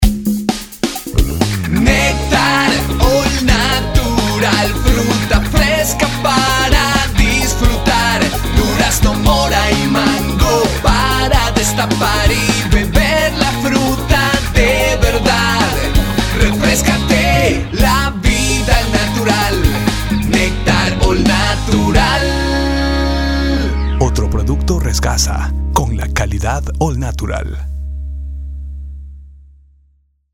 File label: Jingle; Néctar All Natural 2009